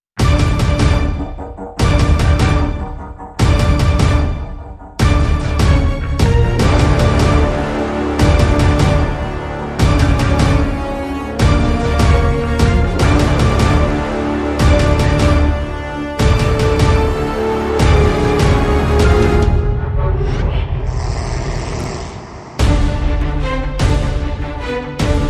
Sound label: No Guitars